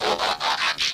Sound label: bumblebee on